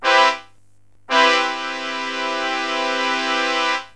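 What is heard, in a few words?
major 7th, synth chime; cubase; CUBASIS; SFZBRASS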